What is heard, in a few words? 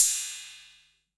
808 tape crash sat; Drums